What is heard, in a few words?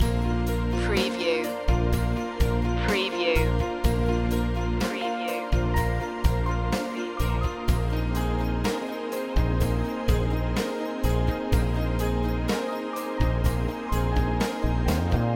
hidden past loop